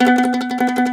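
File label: MANDOLIN TREMOLO, Plucked Strings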